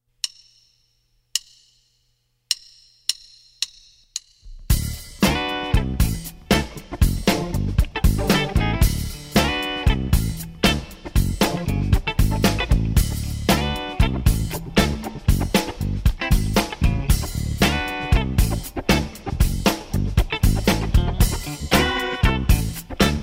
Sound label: with drums